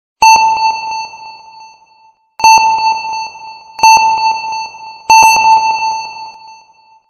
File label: Submarine Sonar